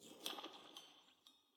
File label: CITY Crowd Individual SFX Footsteps; Silence Incarnate